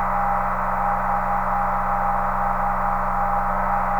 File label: InfiniVerb Noise, sparse soundscape Loops